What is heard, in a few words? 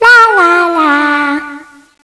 attack hole; cstrike; Teddy CSO